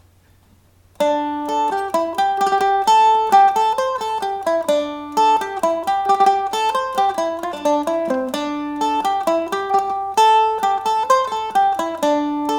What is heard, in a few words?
maids of mitchelstown, Irish Mandolin